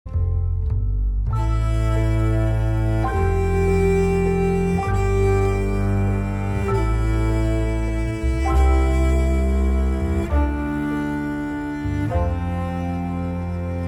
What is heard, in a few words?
piano